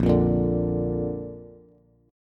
Bb Major, Bass; strummed; JGuitar

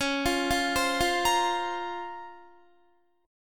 Db Major 13th, Guitar; arpeggiated; JGuitar

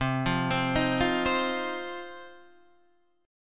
C Minor Double Flat 5th C down Guitar DADGAD

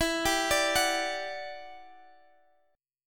E Minor 9th E down Guitar Standard; Em9; arpeggiated; JGuitar

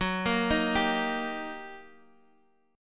Eb Major Gb; arpeggiated; Eb/Gb

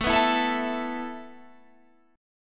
E Suspended 4th A

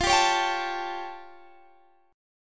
A Augmented 7th F

